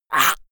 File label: monster choking high; comedy-cartoon; soundpool